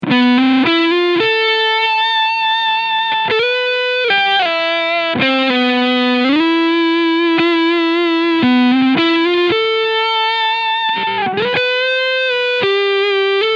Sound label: PRS SE McCarty 594 Vintage SB Leadsound Neck Humbucker